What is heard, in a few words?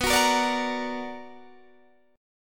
B Minor Major 7th, Guitar, Open D